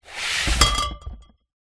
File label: foley box bottles wine slide hard floor; zapsplat